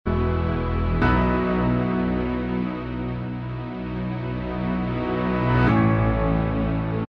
Inst

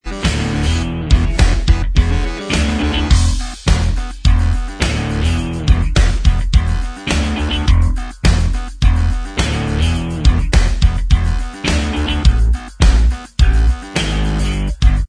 Inst Track, backing tracks

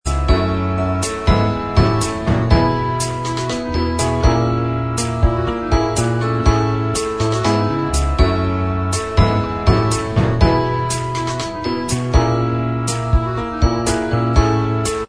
Inst

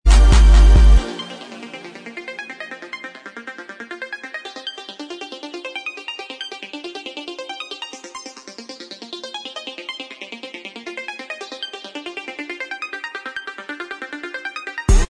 Inst Track, backing tracks

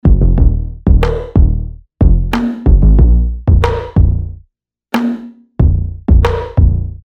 Inst

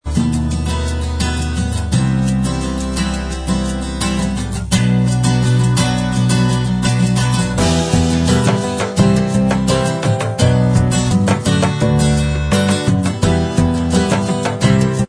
acoustic version Inst

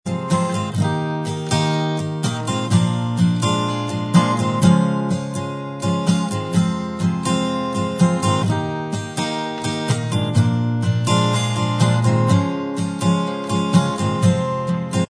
acoustic Inst Track, backing tracks

